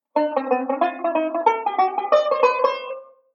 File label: Enclosure Variation, banjo; Beyond Chord Melody Volume II